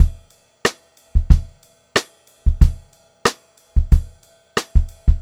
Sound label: Funk-Groove Drumloops